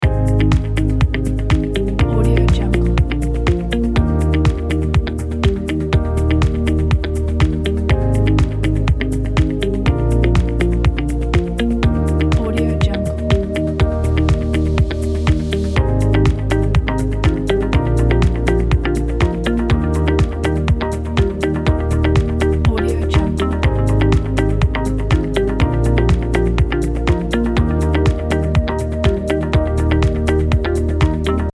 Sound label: inspiring loop